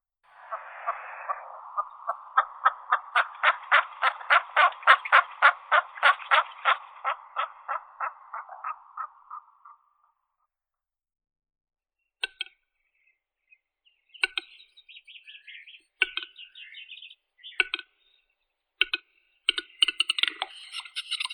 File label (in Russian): gluhar tetrao urogallus